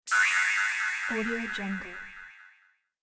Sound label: wrong answer